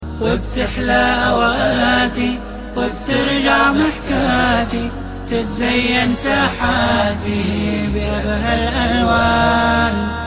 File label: nasheed